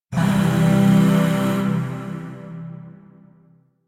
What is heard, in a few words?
Shawzin Phrygian Chord A; Music; Chords; Warframe; Zariman Void Shawzin; DE